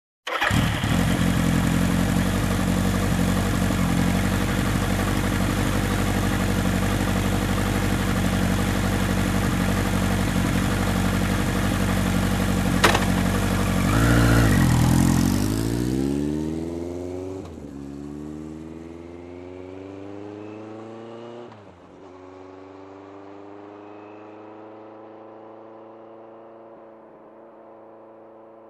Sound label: Motorcycle engine ignition and driving away